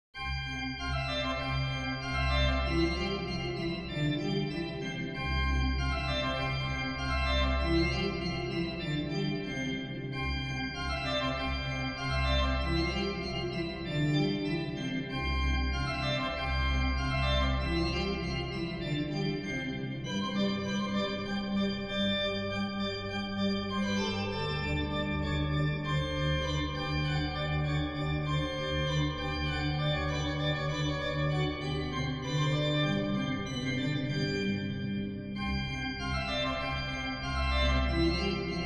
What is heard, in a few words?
musette